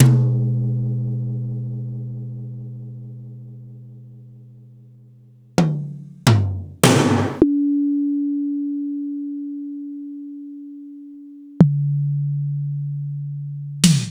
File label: Korg 05R-W Korg Toms